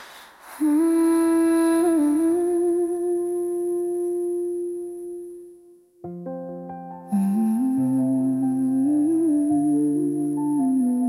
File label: lullaby, name songs; camila